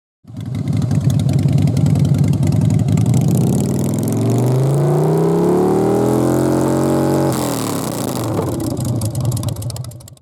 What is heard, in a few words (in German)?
Harley, motorradlaerm